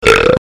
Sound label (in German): ruelpsen; Rülpsen 16